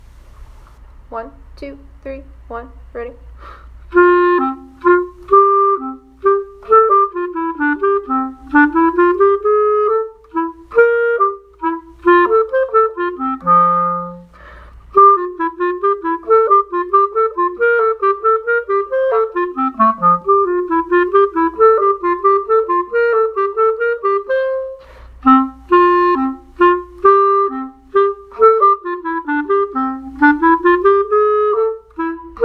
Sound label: horn